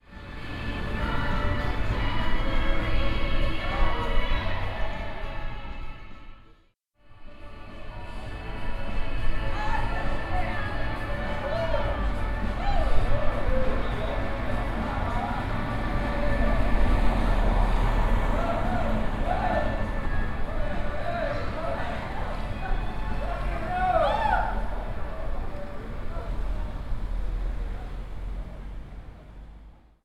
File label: window music, stockholm